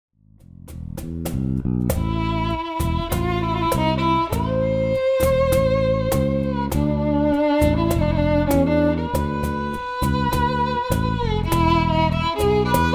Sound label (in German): Violine